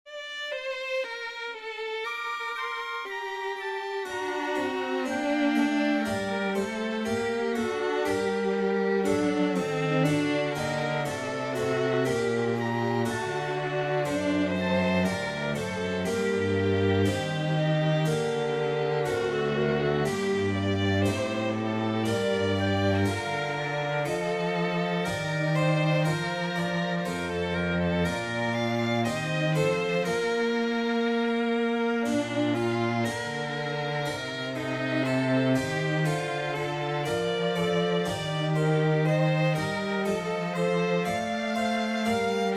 Trio Sonata g minor Adagio, Alto Recorder, Violin and Continuo